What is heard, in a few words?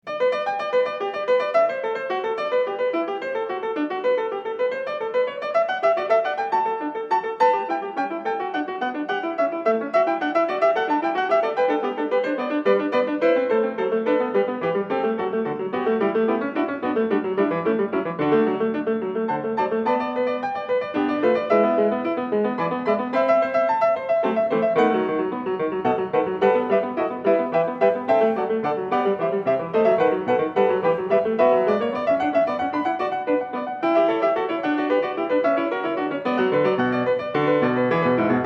Fugue in G; BWV-902; Bach; Prelude & Fugue in G